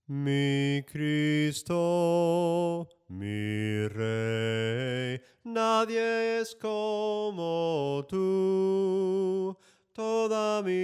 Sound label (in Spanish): SOPRANO CANTADO